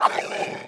throwable attack

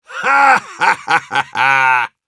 laugh happy, ru; Heavy; Насмешки пулемётчика

